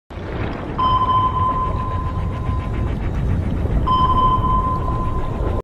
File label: sonar radar; nerd emoji; groupchat meme; discord